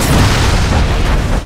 Remington 870 Magnum; weapon

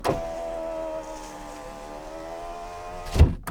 window close

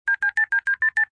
touch tone

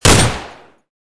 tec9; weapon

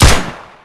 Heckler AND Koch MP5; weapon

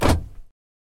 car lock inside

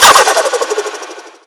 turbo shift, engine sound

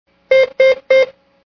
atm error

